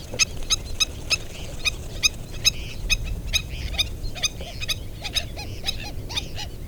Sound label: Black-necked Stilt, Himantopus mexicanus; shorebirds rails